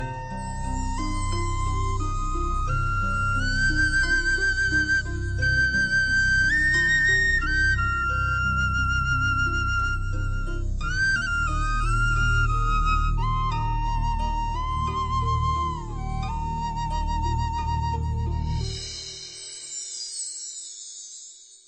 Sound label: Beautiful Flute